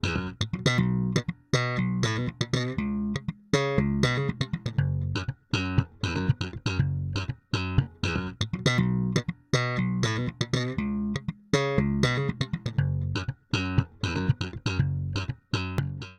Bass

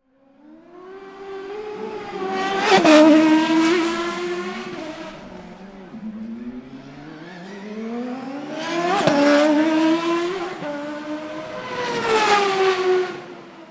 cars; vehicles

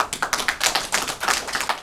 PEOP clapping, group Of People

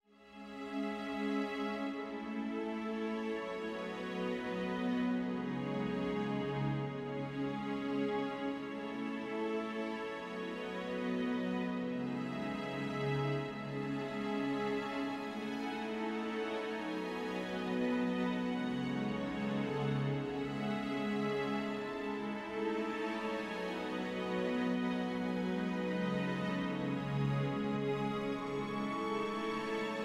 Orchestral Strings Kings, Cinematic Trailer Loops

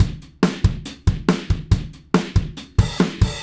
Drums Rock Fill, loops; Alex Sanfilippo

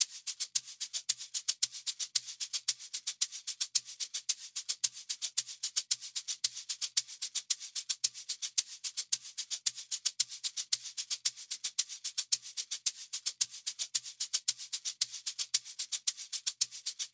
percussion shakers

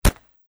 mitt, baseball Ball Bat Glove

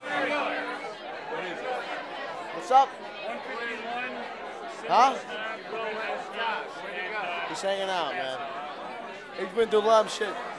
CROWD bar; party People

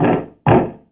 Footsteps 2 on Wood

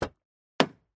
car door light opening of a foreign car; vehicles

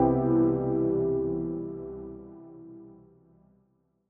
112 C Pad Tail; New Age Ambient Loops; Tail Samples; Spring

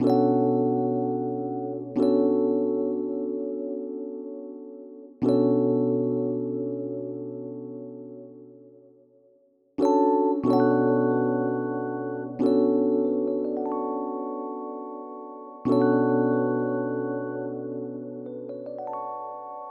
D rhodes loop; Electric Piano; good kind; Electric Piano Rhoads; Various Keys

